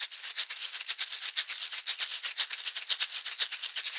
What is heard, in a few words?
Shaker Loop; Async